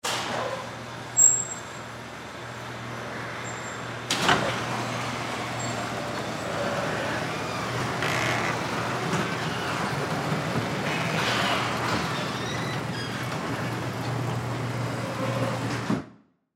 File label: Ambulance Station, Ambulance Station Garage Door Open; Garage Door Sound Effects; Emergency